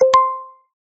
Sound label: start Recording